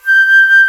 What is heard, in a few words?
flute G4, ebony flute; InVision Interactive; Lightware VOL-1; Instruments & Percussions